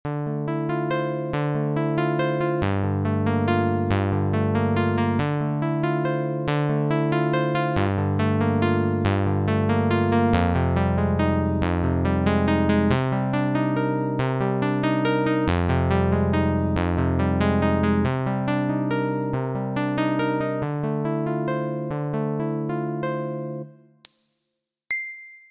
Saw Strike, struck instrument simulator

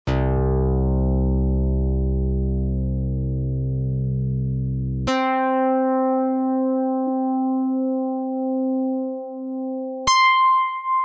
karplus 2

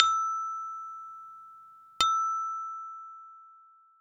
Glockenspiel, struck instrument simulator

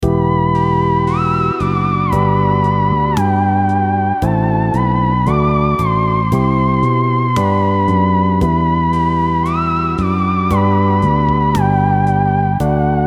virtual analog synthesizer

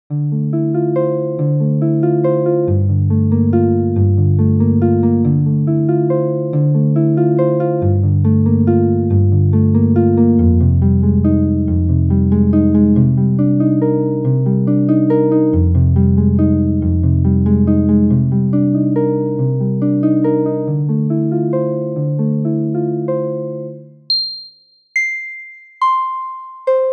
Harp, struck instrument simulator

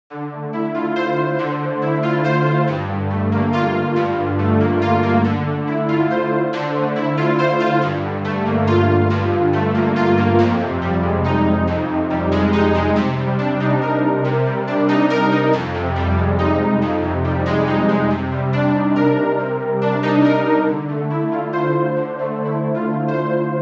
Noise Saw, struck instrument simulator

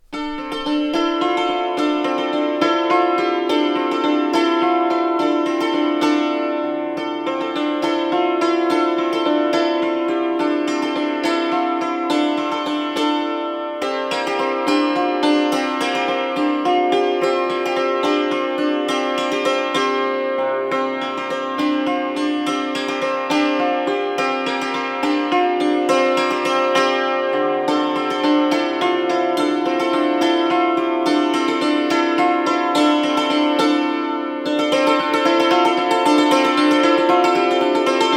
dulcimer

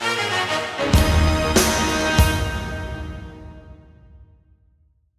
music

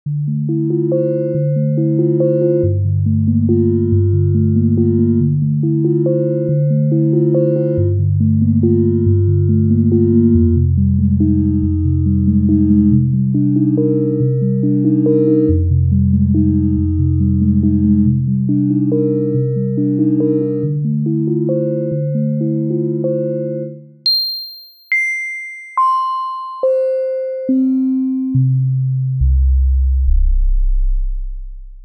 Tank Drum, struck instrument simulator

